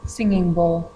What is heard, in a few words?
Bowl, singing